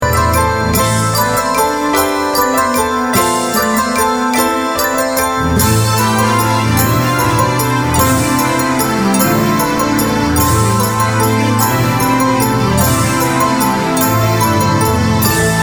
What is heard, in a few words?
Bell; CaroBell